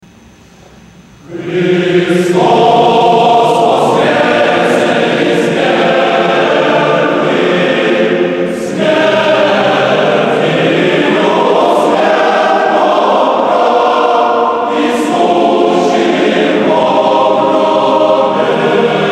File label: male choir; hristos voskrese; male choir of mephi